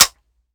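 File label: akilo47 fire first player, weapons; viper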